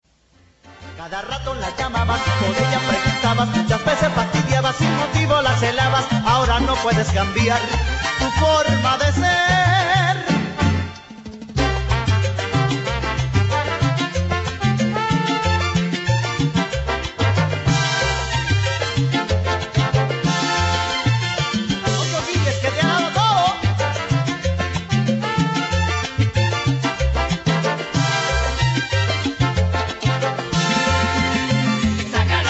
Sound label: salsa